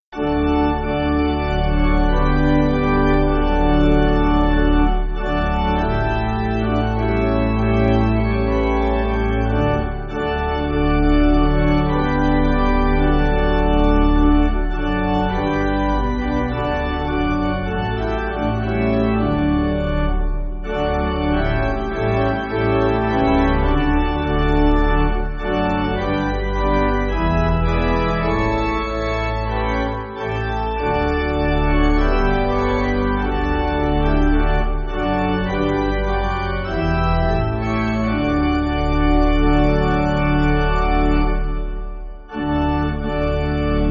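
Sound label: Pipe, Pipe Organ, Music Backing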